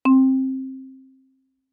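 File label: Marimba yarn fortissimo C2; MIS Pitches - 2014; Percussion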